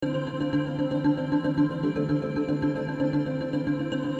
Dm7 115

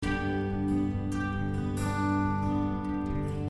Picking D 71

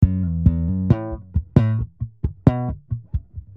Bass Slap A, B 135

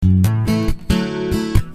Punchy A,D

